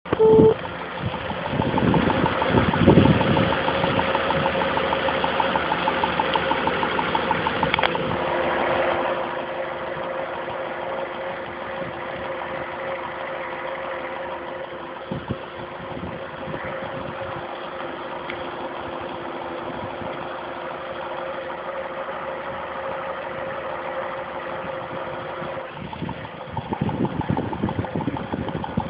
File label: Motor; 750